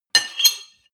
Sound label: cutlery on plate cut squeak hard short, gastronomie; berufe; soundpool